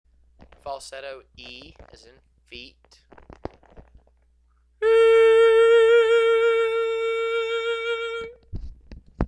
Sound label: Falsetto EE